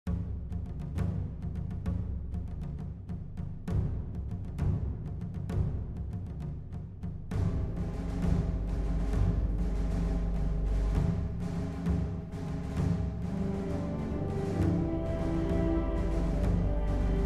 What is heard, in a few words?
Fanfare